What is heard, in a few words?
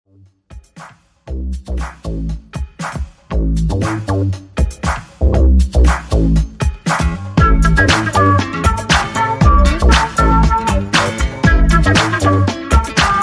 Flute Bounce